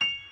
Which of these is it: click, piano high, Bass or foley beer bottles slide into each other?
piano high